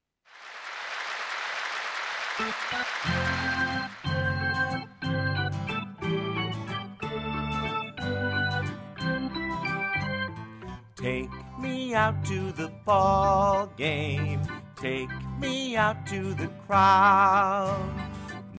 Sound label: take me out to the ballgame vocal, Traditional Folk Song